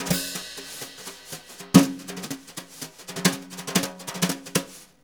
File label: BRU, BRUSH; Univers Sons; Jazzistic